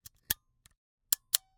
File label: Camera, Argus, aluminum, steel, professional, fully mechanical, advance film, snapshot; Eiravaein Works; Vaeyan IV